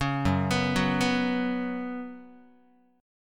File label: F sharp Suspended 4th, Guitar; arpeggiated; F#sus4